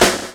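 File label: drum chop; AMG Gota Yashiki Groove Activator; GOTA GROOVES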